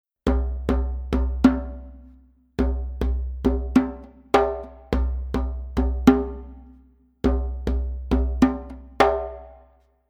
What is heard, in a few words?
Rehearsal Drumming Simple